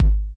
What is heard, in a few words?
house Kick; STYLE KITS; Choosy House Kit